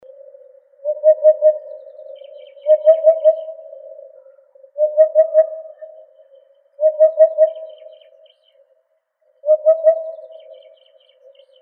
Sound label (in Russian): udod upupa epops